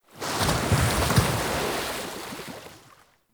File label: Corrupted Sap Waterfall Start; Puzzles; Bean Stalk